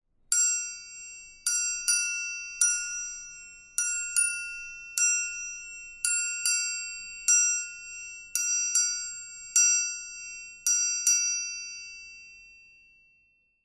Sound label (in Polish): trójkąt; kodowanie na start